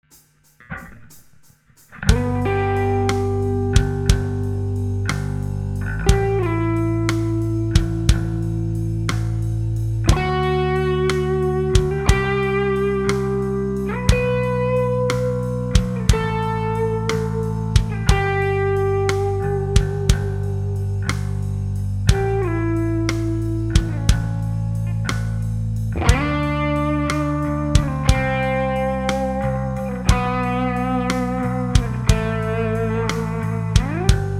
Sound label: Backing Track; country guitar mastery